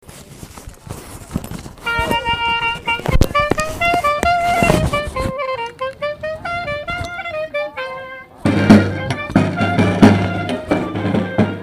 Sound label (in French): marche clarinettes; charivari